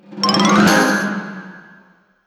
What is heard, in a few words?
reward; Interactive games; Grammar Games; Modal Verbs